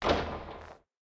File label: reel bounce; rage of the seas